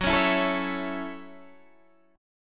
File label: F sharp Minor 7th F sharp, Guitar DADGAD